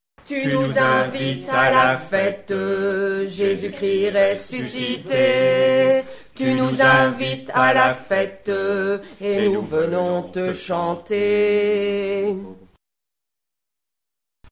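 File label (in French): Tu nous invites à la fête Tenor Basse, chœur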